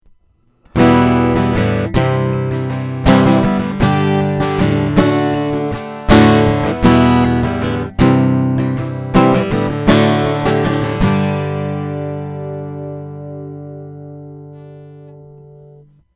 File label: Harley Benton CLD 15CE Flame Koa Exotic Low Boost